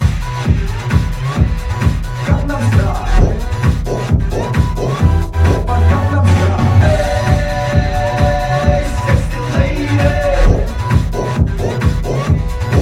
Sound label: Neighbor's apartment